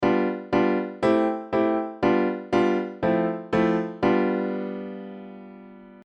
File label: Mixolydian prog; Modal Harmony: An In-Depth How-To Guide